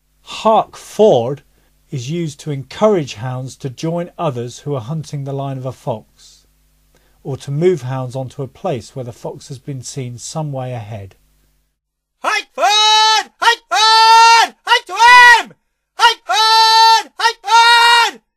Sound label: "harrk fo-or-orrad", calls